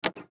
Arcade Game, Arcade Game Joystick Movement Forward Snap Back; Pac-Man; Arcades; Blastwave